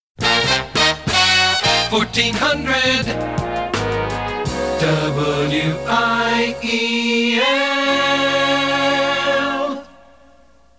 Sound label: jingle